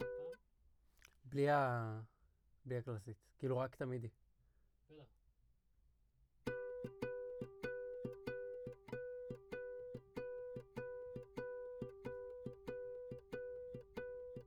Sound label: Classic Guitar